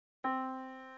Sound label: yamaha Disk